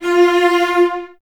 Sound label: Cellos F 4 Detache; Miroslav Vitous; String Ensembles